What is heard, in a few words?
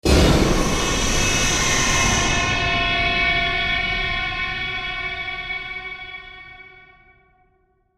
Hit To Whoosh; Hits; Sound Design; BlastWave